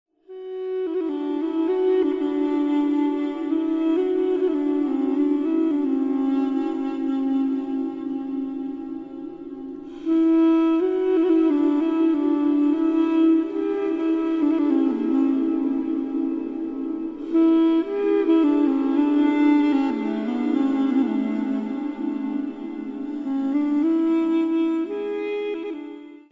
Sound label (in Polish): muzyka bez oplat